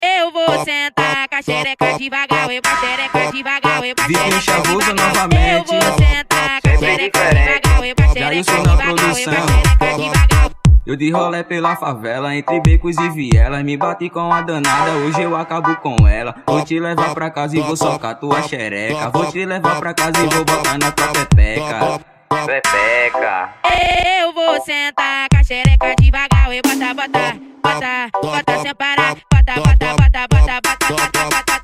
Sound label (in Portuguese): brega funk